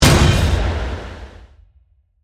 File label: Hit Breath Reverb; Hit Sound Effects; Sound Design